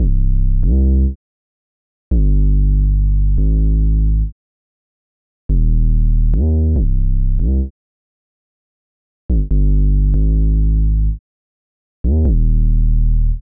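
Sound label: Drill Melodies C minor 142bpm bass; Drippy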